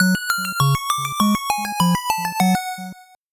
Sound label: 8bit jingle slow